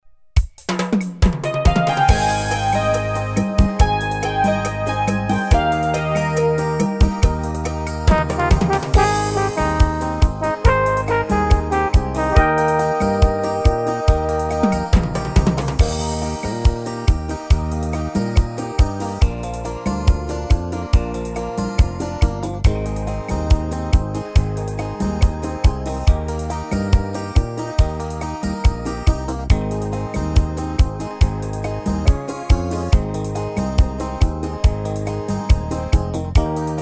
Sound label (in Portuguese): forró ritmos